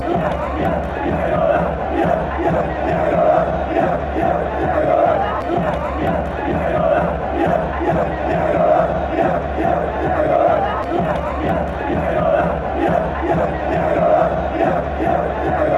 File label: chant, argentina; huracan